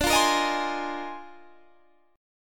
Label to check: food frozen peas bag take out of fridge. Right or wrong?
Wrong